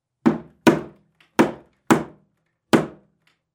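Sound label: fist sound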